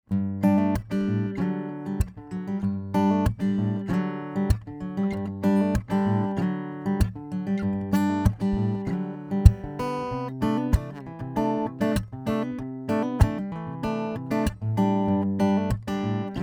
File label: ibanez aam700ce percussive both pickups